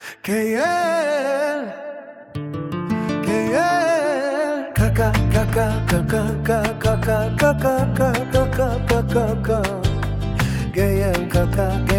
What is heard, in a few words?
flamenco